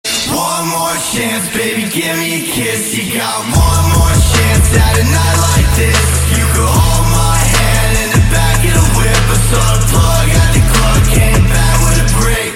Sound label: Kiss